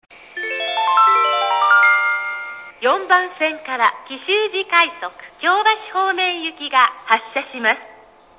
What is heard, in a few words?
wakayama track rapid, eki; track 4; kishuji rapid; nishinihon